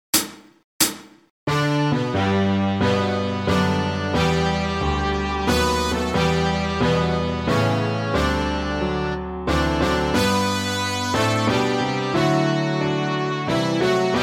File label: melody with piano in G